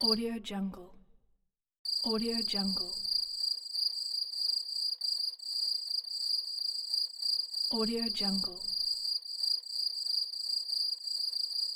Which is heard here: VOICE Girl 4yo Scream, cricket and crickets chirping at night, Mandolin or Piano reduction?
cricket and crickets chirping at night